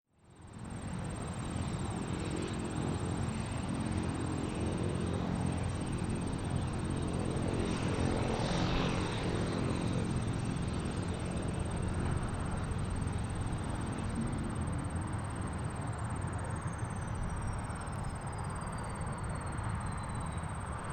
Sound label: Plane Idle Distant; transportation